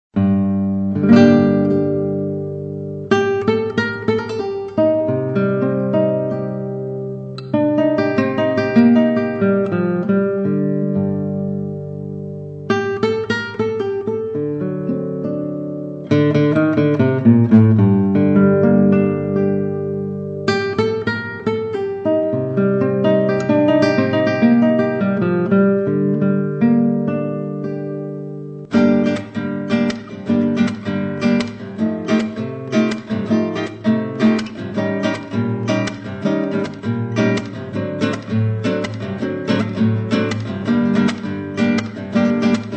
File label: Guitar Serenade